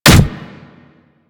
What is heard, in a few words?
Claw Hit; Manic; Attack